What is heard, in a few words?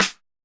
hiphop snare, Effect on